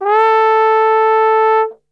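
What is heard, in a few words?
A 2 trombone glissando, Brass Super Section; Best Service; TRMB GLISS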